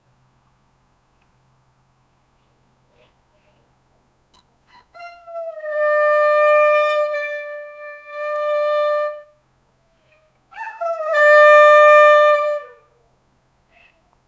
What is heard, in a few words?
lamborgini sound; automobiles